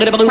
VOC

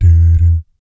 "BS D D", vocal jazz fx

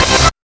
TEKHIT

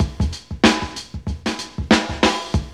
LOOP, DRUM; E-Lab; X Static Goldmine 2

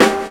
SD RING; E-Lab; X Static Goldmine 2; akai

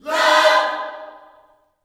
LOVE CHORD, Vocal Gospel; Spectrasonics; Vocal Planet Vol.1 Gospel; akai

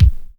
BD DISC; E-Lab; X Static Goldmine 2; akai

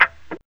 TSCHAK

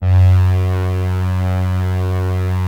Atmo Pads; Best Service; XX Large

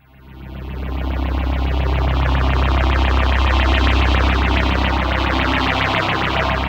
PHASE PAD, ATMO PADS; Best Service; XX Large

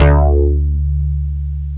MOOG BAS

